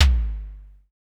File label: Drum X Clap; Pearl Drum-X